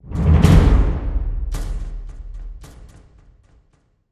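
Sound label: stinger percussion; transformers war for cybertron gamerip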